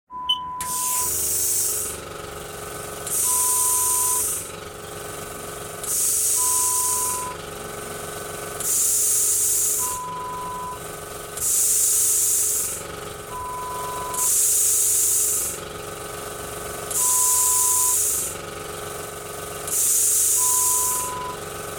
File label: Car tire Inflator sound